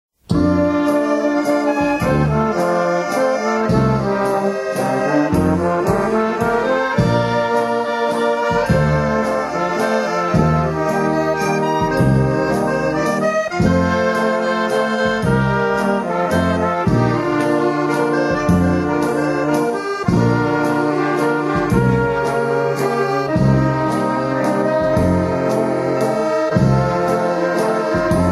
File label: waltz